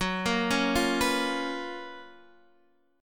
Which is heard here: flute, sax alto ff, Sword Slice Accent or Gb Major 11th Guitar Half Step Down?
Gb Major 11th Guitar Half Step Down